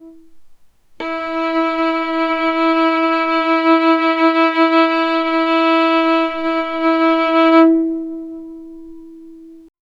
violin pizzicato sound

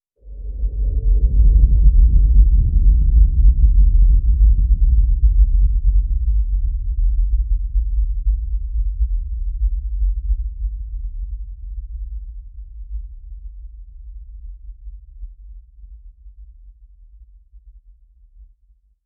energy effects

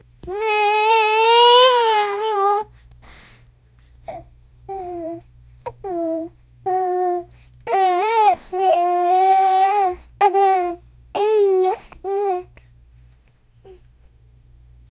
a high pitched soft baby, calling

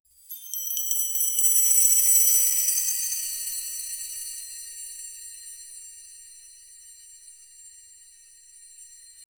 blend the lightning crack

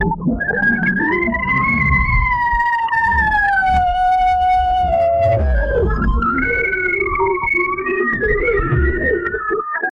crazy science lab experim

